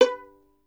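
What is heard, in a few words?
STR violin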